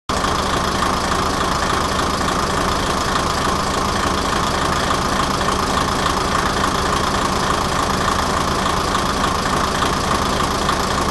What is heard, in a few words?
idle, rail; kominato; kiha208